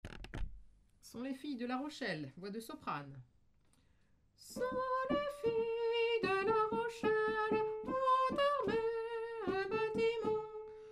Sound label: soprano